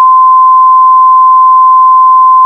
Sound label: 1033.6 hz sine